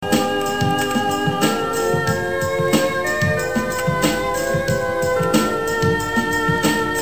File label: depressing rock music